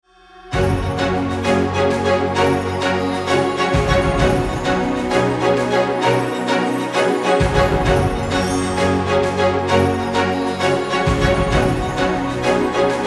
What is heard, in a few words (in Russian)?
Фанфары церемония